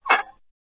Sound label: Squeak Tiny Screw, screws